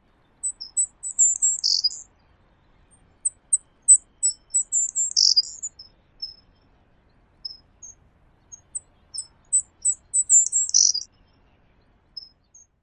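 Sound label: savannah sparrow Belding's song Belding's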